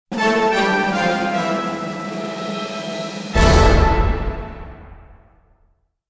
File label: announce fanfare; tryumph